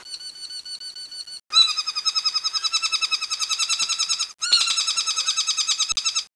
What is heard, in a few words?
saddleback, birds